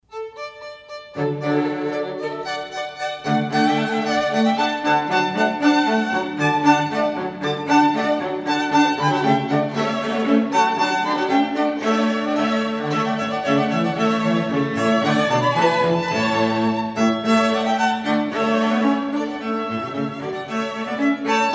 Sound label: strings